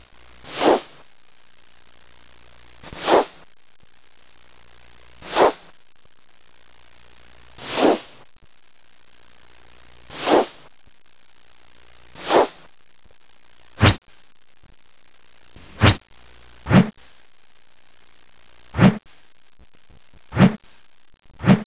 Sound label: Golf Swing